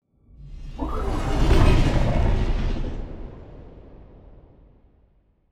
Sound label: ship cruiser move; Stellaris; shroud; ships